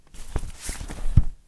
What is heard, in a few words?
umbrella close